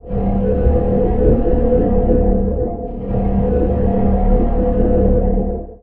CARRIER attack

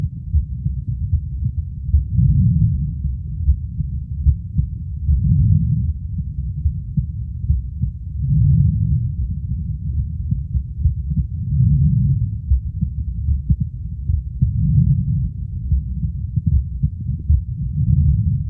moving Loop

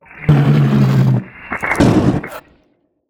SPINELING GIANT idle

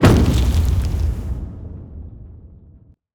tongue Hit

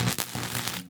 Stun Baton

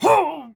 Male damage

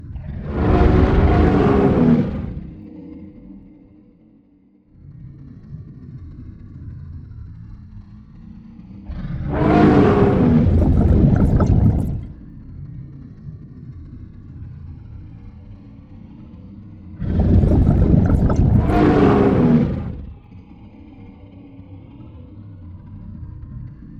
idle Loop; ENDWORM

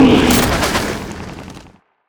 teeth

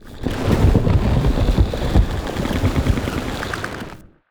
ABYSSM idle

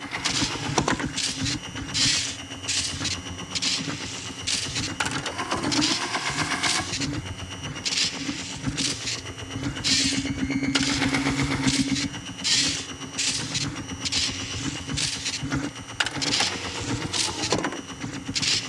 Fabricator Track

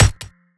chain Gun Shot, WEAPONS

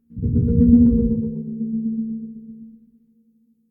death; MOLOCH

